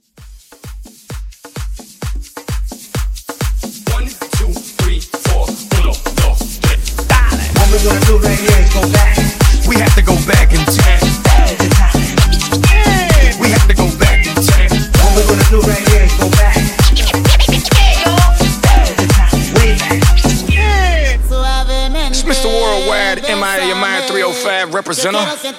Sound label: Latin Club 130